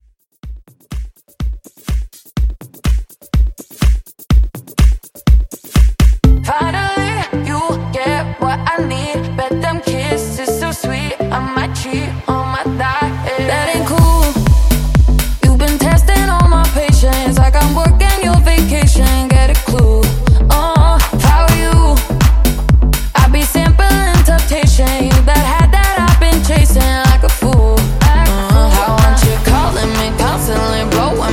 Future House Redrum

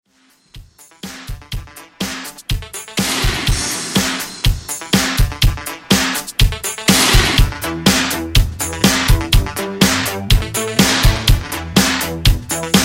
80s Electropop Redrum 123Bpm

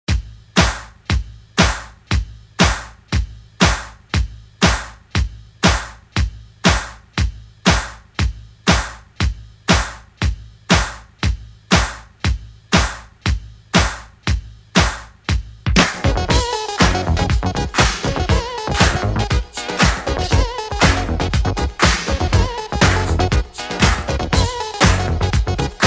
70s ReDrum 134Bpm; DJDOLLS

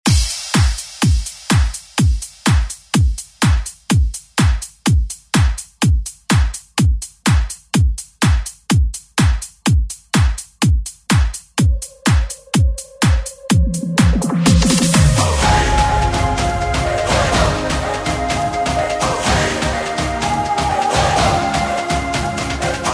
2023 ReDrum

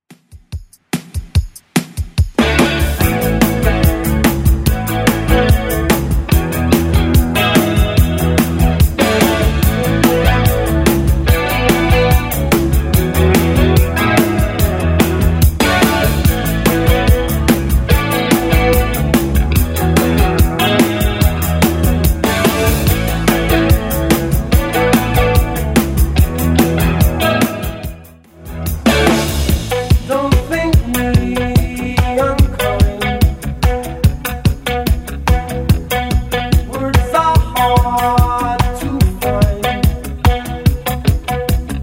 80s Redrum 145bpm

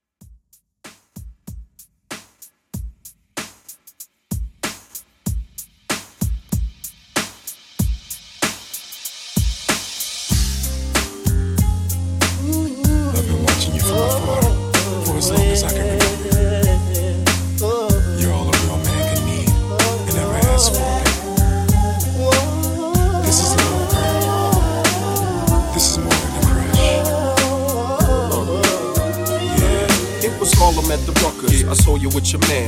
Old HipHop Redrum 95Bpm

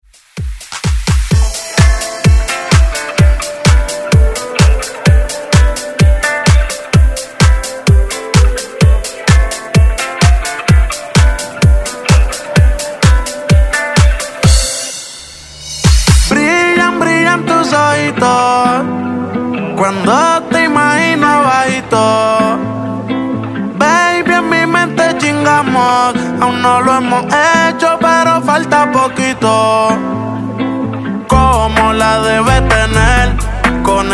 Latin Dance; Redrum

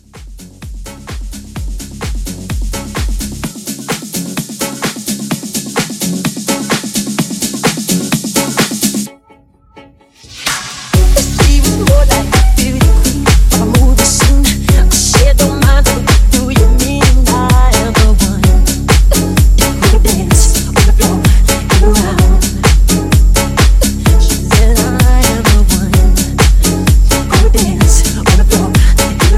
Power House Mashup 128 CLEAN

